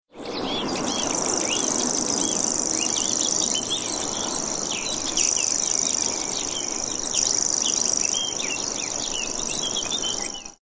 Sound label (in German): feldschwirl